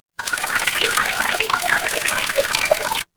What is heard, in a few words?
water pour